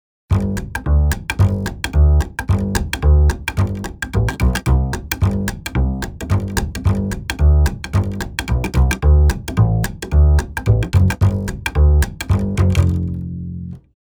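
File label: roots urban note palm flick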